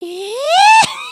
cheer; emotes

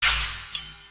Pet Cat Give Egg Ching; creatures pets

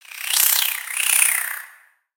Spider Idle Look; creatures mechanical; Lego

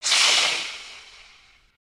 baby spider idle; creatures mechanical

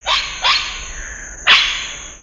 Bird Amazon One shots; ambience; Lego

